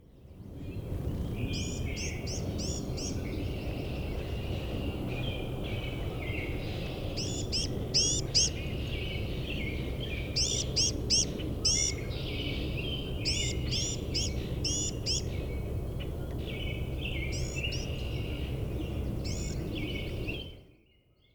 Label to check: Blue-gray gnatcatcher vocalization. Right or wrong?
Right